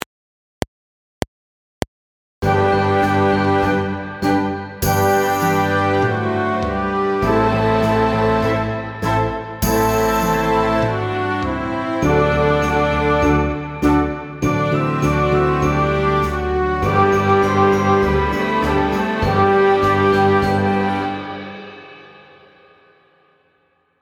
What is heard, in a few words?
Short and Sweet Guide Track; Exercise 2